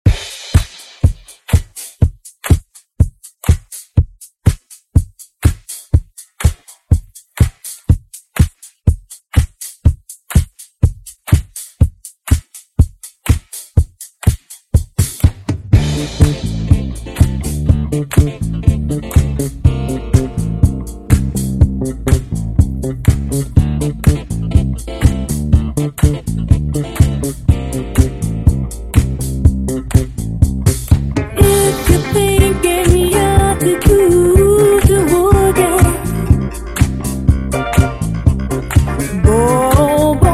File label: Funk Intro Outro 122Bpm